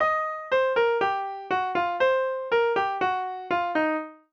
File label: blues; First Steps